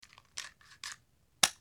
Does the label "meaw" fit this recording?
No